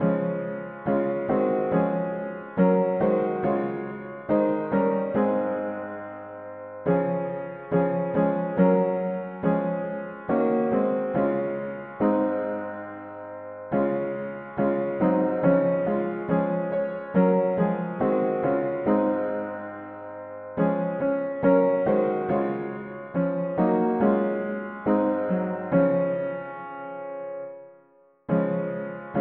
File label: mono piano, hymns; Jesus shall reign where er the sun